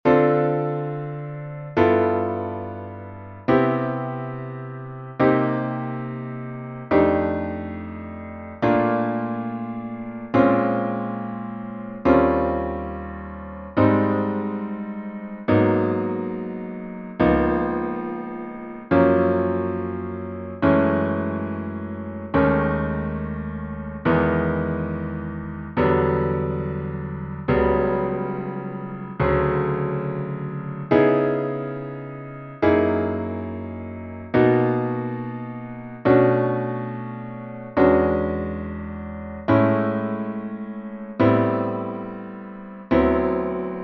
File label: Voicings Major Key II V I Jazz 9ths; Voicings 3: Major Key II-V-I's: LP2 - Jazz 9ths